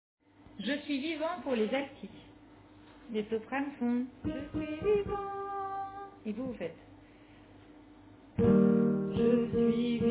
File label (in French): Alto